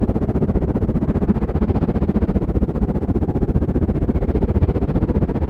Flutter

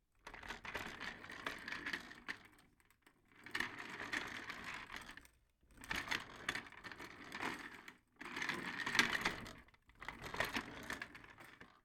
Moving Office Chair

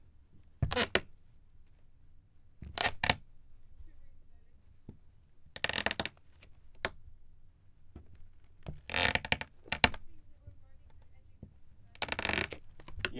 Walking On Wooden Floorboards

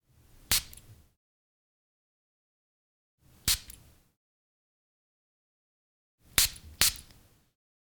Perfume

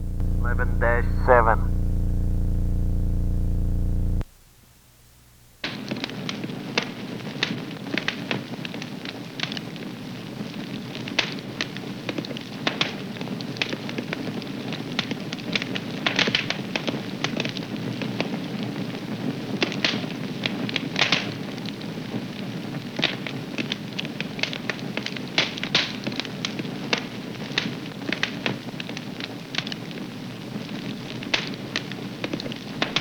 Fire Burning

